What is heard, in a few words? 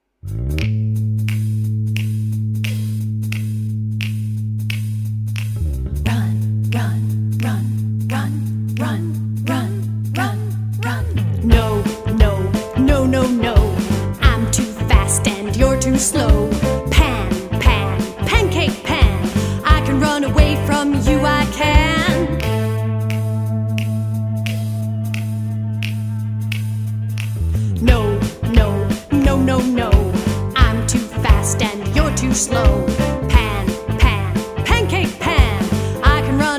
Song